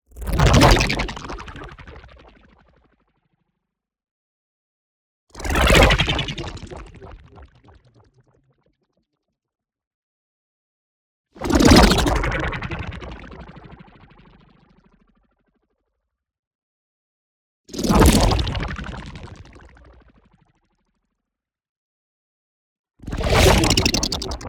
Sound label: NEON WHOOSH LARGE FAST Mass Absorber; boom library